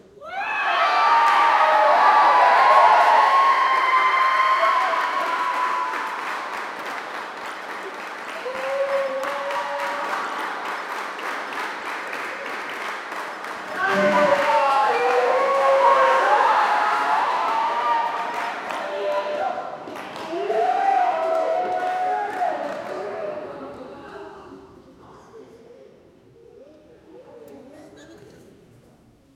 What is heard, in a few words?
indoor swimming pool crow